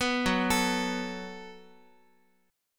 F sharp Minor Double Flat 5th F sharp, Guitar; arpeggiated

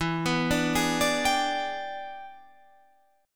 E 7th Sharp 9th Guitar Half Step Down, chord

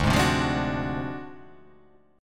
D 7th Sharp 9th Flat 5th D down, chord

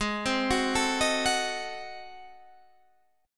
Ab 7th Flat 9th Guitar Standard, chord; Ab7b9 arpeggiated; JGuitar

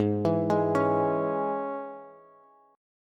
Ab Suspended 4th Sharp 5th Bass; arpeggiated; JGuitar

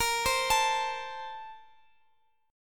A sharp Major 7th Suspended 2nd, Guitar, Open F; arpeggiated

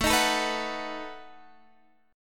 B 7th A

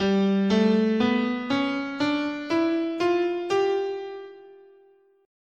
G Lydian Dominant, scale

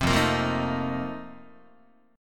F 11th F, down, Guitar; F11 strummed

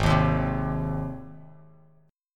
Ab Major Ab down Bass Standard; strummed; JGuitar

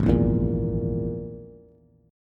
Ab Suspended 2nd Sharp 5th Ab Bass; strummed; JGuitar

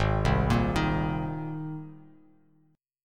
G sharp Major Add 9th G sharp, Bass Standard; G#add9 arpeggiated; JGuitar

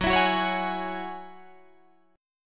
Gb 7th Suspended 2nd Gb Guitar Drop D

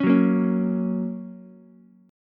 A sharp Minor Sharp 5th down Ukulele, A#m#5 chord; strummed; JGuitar

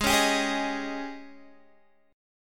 Ab Major 7th Suspended 4th Ab down Guitar DADGAD

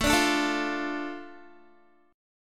A Suspended 4th Sharp 5th down Guitar; Asus4#5; strummed

